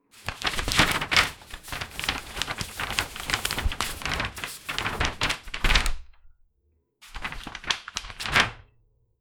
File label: paper rustle under door